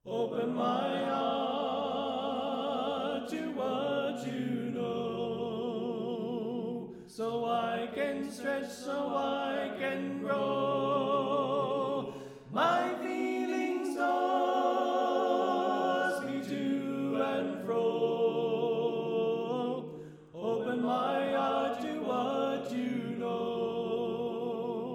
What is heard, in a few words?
Alto Left